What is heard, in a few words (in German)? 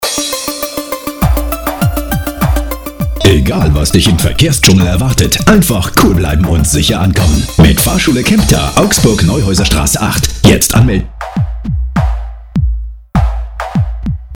Jingle Fahrschule